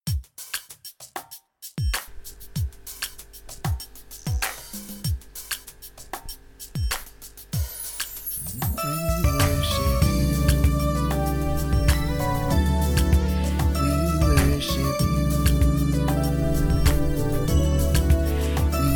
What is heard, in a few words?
TENOR